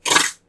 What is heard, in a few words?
gun